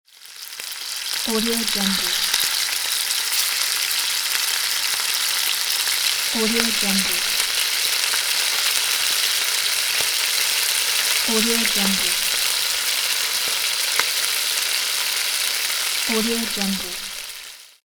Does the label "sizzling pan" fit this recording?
Yes